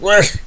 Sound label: Cough